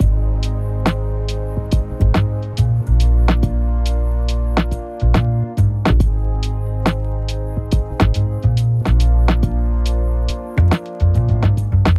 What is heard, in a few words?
Vibes, Loops